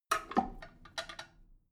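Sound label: drain valve close heavy, bathroom; haus garten; badezimmer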